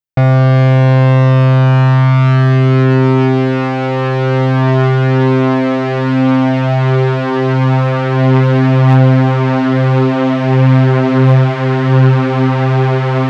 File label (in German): OB-X8 Unison von null detuned bis voll detuned